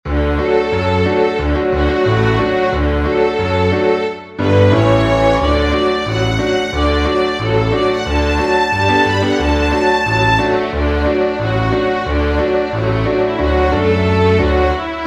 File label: Cape Cod Shantey viool